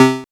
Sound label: death beep; player